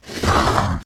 breath; DustDemon

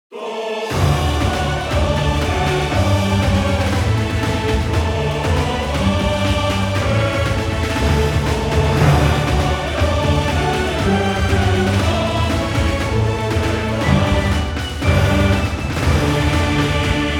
Menu British Victory; Blackwake

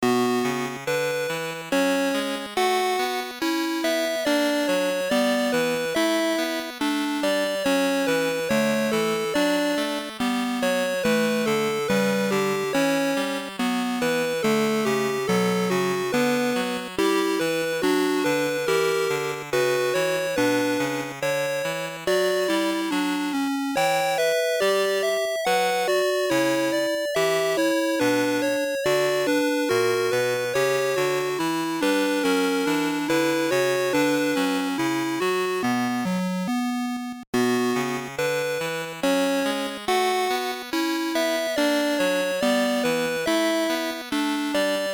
BGM, WonderSwan Color; Wizardry I Proving Grounds of the Mad Overlord

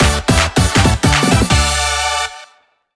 (Jingle) Clear; 10 Second Run Returns

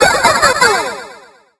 Witch Laugh; SFX Necro; Candies 'n Curses; Android, iOS

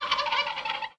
Sfx - Turkey Man Panic

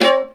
Viola Missed note; Swamp; Music Ray

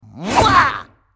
Yellow Attack; Character Yellow Attack

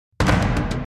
Music Battle Night In; Frozen Flame

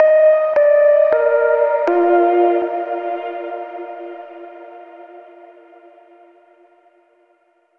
Overlay Tone

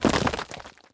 dirt break

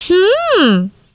Caramel Miss Sound; Jolly Battle

